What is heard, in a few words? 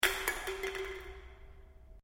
Can Aluminium Inside Drop; various metal cans; Drops (Sound Effects:Objects)